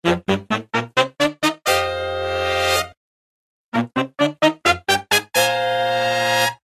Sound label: ensemble, ringtones; Sony Ericsson Xperia Arc